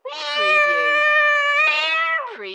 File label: Cat Meow Single